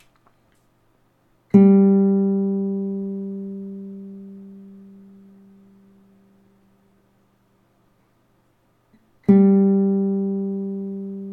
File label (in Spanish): Sonido de guitarra española cuerda 3 Sol G; afinar una guitarra